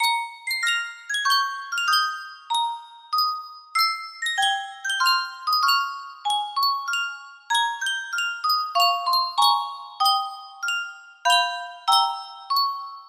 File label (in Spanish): Yunsheng caja de musica, music box melody